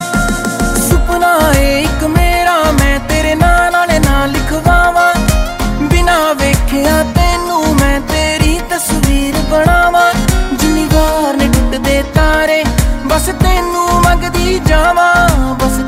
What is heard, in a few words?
punjabi ringtone